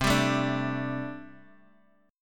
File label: G Suspended 2nd Suspended 4th C